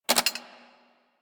Mech Release, Weapons; Tenno; AK Bolto Prime

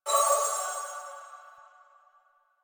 Choir Bow Charge Full; Warframe; Weapons

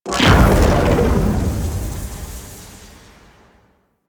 EMP Push; DE; Warframe; Weapons; Archwing; Powers

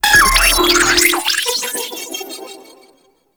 Riot Moa Hack Success; Warframe

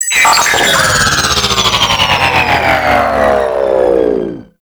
Drone Disable; Warframe; Nokko Colony; Drone Defense

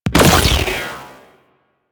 Barrage Fire; Warframe; DE; Sentients; Ortholyst

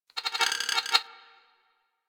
Beep Stutter; Warframe; Ambience; Corpus

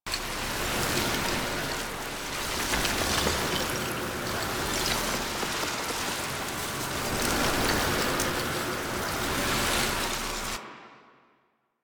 Alchemy Grenade Hold Loop Cold; Warframe; Entrati

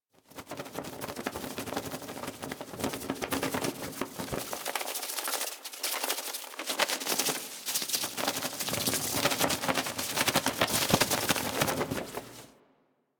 Foley Operator Hood; The War Within; Mountain Pass Intro

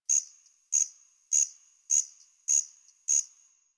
Tree Cricket; Ambience; Warframe; NineteenNinetyNine

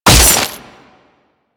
Impact D; Condemn; Warframe; Priest

